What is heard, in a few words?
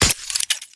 scissors